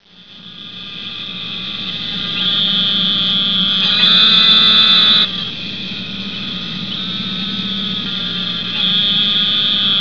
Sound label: narrowmouth toad